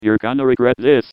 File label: pyro taunt rps exert